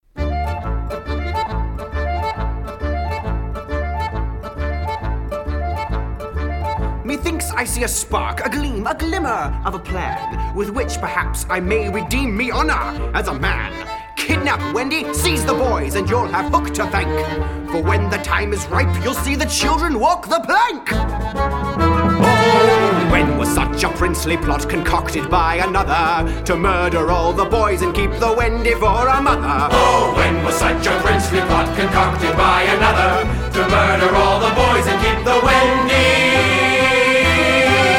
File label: hooks tarantella